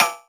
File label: Metalica